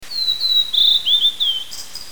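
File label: blue-and-white flycatcher; ooruri; toritori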